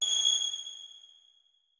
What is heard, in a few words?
notes, keyboard; Hideout Furniture